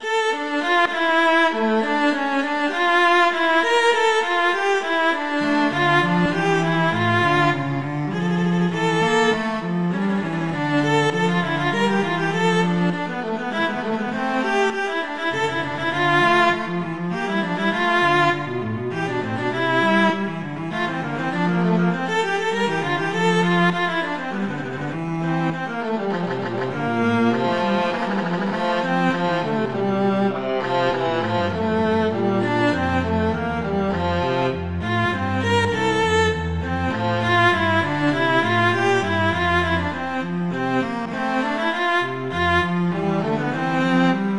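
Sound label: Vivace moderato, Viola Cello; Telemann; Canonic; Vla Vc; Sonata 4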